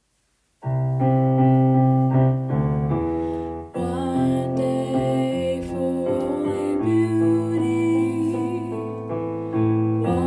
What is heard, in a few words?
alto